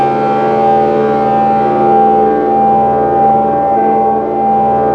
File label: BELLS, WEIRD ATMOS; Zero-G Ambient 1; WEIRDATMOS#1